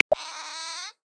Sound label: Sea Otter, Polar Region; Xiaomi MIUI-14